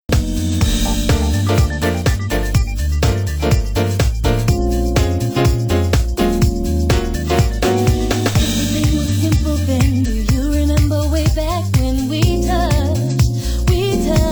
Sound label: alto